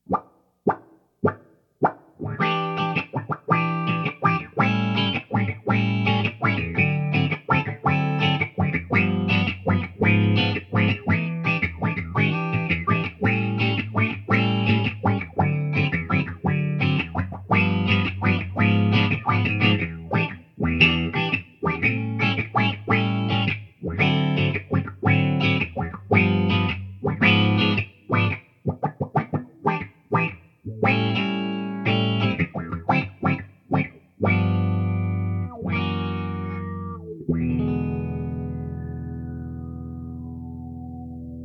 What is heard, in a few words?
EMMA Discumbobulator; pedal